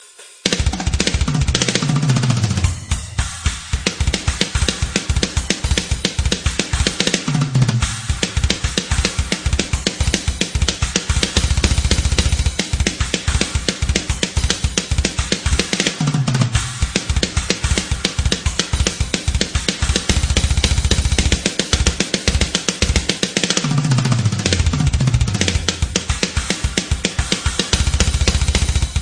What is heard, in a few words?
mental drum beats; ringtones